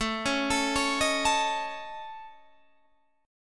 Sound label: A Major Flat 5th A Guitar Standard, chord; AMb5; arpeggiated; JGuitar